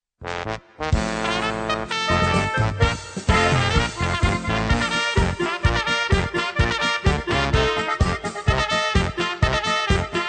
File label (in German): volkstuemlich trompeten echo